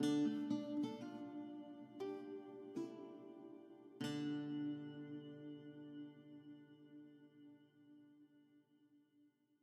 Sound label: Citadel Harp; Frozen Flame; windows